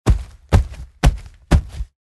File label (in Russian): topot nog